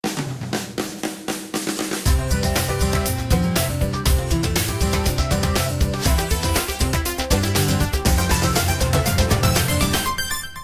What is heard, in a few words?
Wake up; Tecno Spark 7; alarms